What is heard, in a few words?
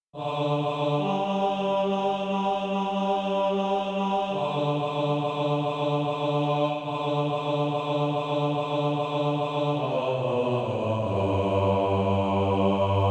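bass, Practise Music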